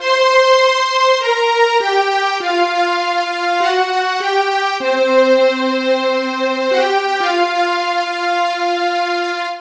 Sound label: Disco Strings; струнные